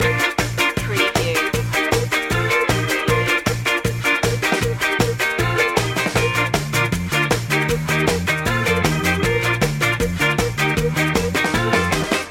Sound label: ska shimmy loop